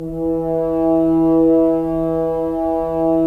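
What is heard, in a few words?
Solo French Horn, Brass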